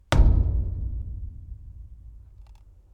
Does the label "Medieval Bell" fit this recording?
No